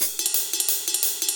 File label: rides